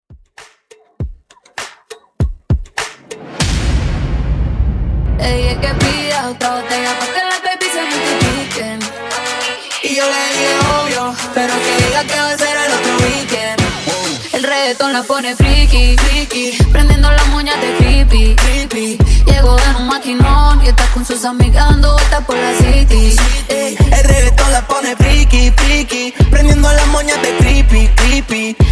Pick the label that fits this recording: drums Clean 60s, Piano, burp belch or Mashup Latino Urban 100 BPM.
Mashup Latino Urban 100 BPM